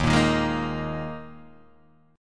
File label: D sharp Suspended 4th, Guitar, DADGAD